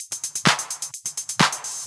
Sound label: Electro Loop 128 BPM; Vengeance Samples